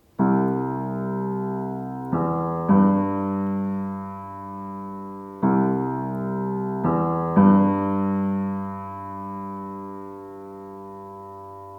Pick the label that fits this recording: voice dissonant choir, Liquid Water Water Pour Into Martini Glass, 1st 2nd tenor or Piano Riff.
Piano Riff